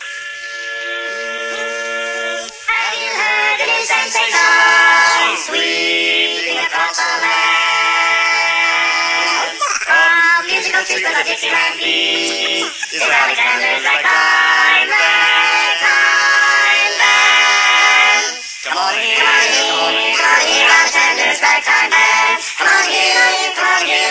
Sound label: ragtime band